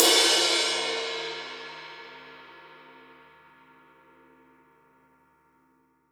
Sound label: Drums Acoustic, RAW KIT; USB Soundscan vol.10; AKAI; RAW KIT 5